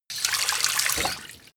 tap off short; berufe

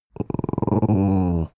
dog small growl soft; natur-tiere; hunde

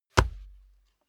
step barefoot wood parquet single trample; Footsteps; schritte

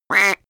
quack duck, comedy cartoon vocals; soundpool

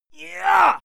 male fight scream yah short; menschen; schreie

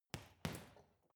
boxing punching bag side hook double soft; sport freizeit; boxen

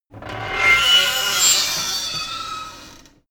metal door screak thriller close

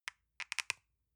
lotion lid open soft; berufe; friseur

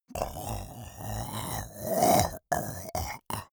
monster choking zombie idle; comedy-cartoon; soundpool

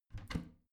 bathroom cabinet both open regular; objekte; tueren

